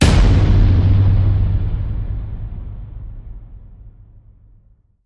Hit Big Pulverize

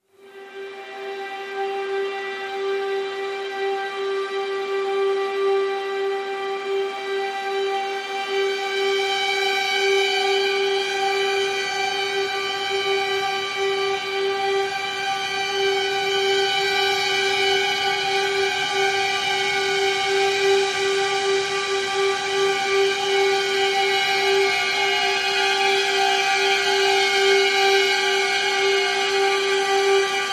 Pads Uneasy String G

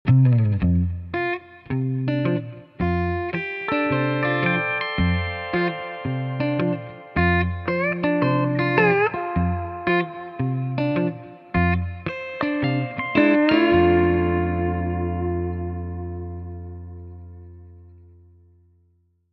Dirt Road Shuffle

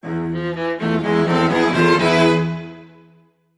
Tango Sting

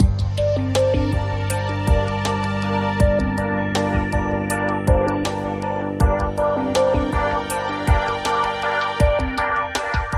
Future Chill Loop

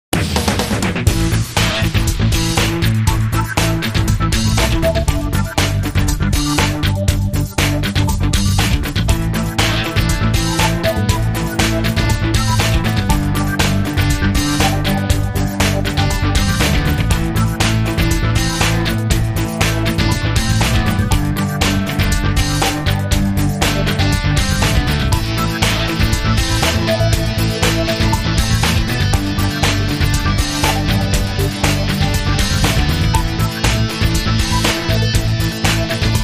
Break Rock Promo

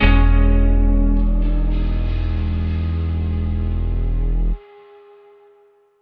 Link Sting

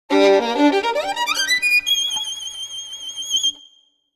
Violin Classic End

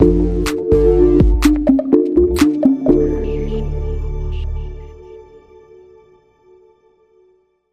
Sonic R&B Light

